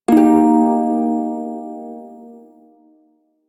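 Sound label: Prime Shawzin Pentatonic Minor Chord H; Music; Warframe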